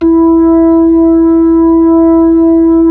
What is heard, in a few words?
B-3; Roland LCDP10 Keys of the 60s and 70s 2